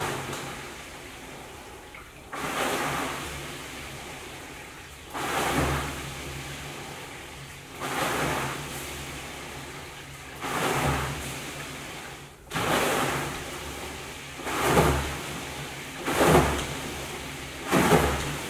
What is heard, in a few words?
WaterRower